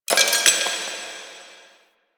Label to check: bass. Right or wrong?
Wrong